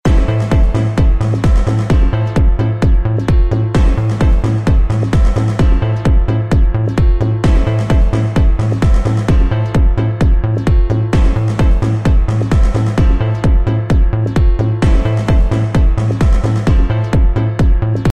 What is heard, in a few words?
urban beats